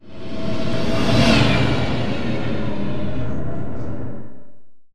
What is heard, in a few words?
Robot Arm Track Pass By; Boomtown; Titanfall 2